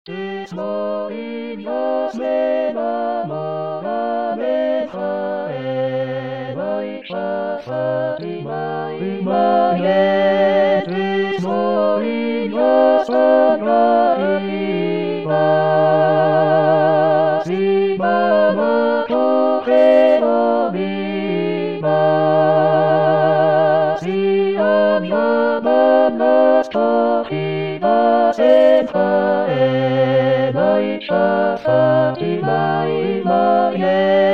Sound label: Tutti; Tres Morillas